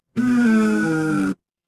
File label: feedback error